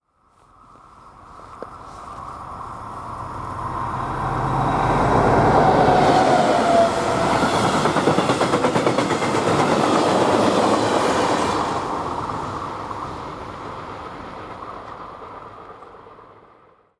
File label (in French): trains diesel et electriques; sonotheque